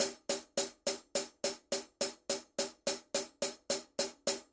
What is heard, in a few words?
brass 14 hi-hat eighths